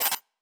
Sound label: Glitch, Electric; Sci-Fi Sounds